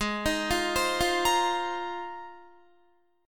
Ab 6th Add 9th, Guitar; arpeggiated

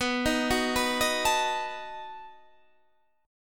B 7th B down Guitar Standard; B7 arpeggiated; JGuitar

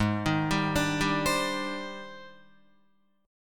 Ab Major Sharp 11th Ab down Guitar; AbM#11 arpeggiated; JGuitar

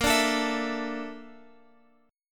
Bb Major 7th Flat 5th Bb down Guitar Open F; strummed; BbM7b5

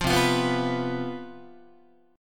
C Major 7th Suspended 4th, C, down, Guitar; strummed

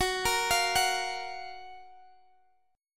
Gb Major 7th Gb down Guitar Open G, chord

